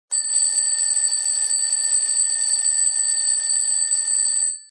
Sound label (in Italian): Vecchia Sveglia